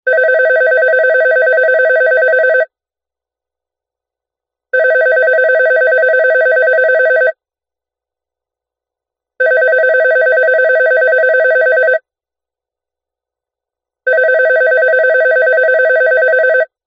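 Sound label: Telephone 4 Rings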